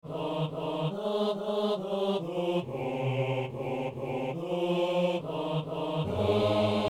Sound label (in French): psaume, hommes; Psaume 95 (96); Messe du 17 janvier à Saint-Denis